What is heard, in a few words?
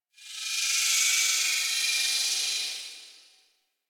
Sword Pulse; Cinematics; Warframe; Orbiter Cradle